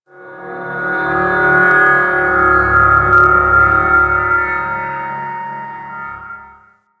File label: Creepy Screech; Warframe; Ambient